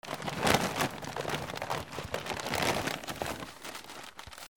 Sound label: PAPER